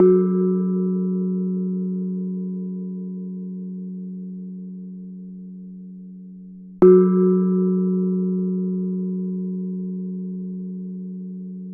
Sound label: Thai Gong